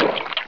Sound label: splish; mk2